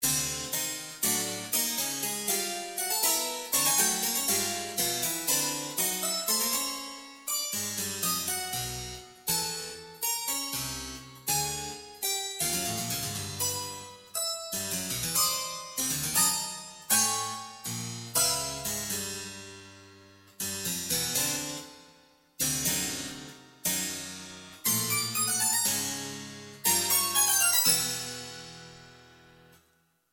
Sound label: Tone